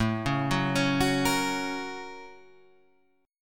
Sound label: F sharp Major A; arpeggiated; JGuitar